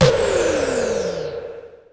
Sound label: SPACE FALL; E-MU Formula 4000 Series Vol. 2; Effectors